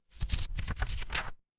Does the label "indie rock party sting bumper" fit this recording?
No